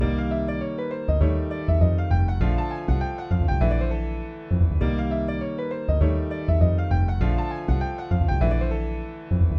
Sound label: ii-v7 combinations